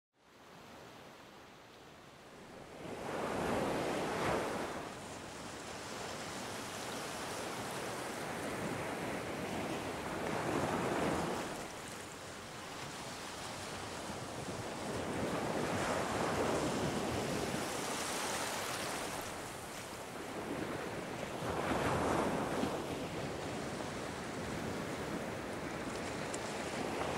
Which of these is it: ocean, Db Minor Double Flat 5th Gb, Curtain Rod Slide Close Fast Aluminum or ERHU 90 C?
ocean